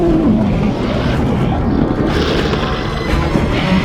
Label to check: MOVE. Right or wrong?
Wrong